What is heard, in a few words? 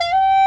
bawu F3; AKAI S6000 CD-ROM - Volume 1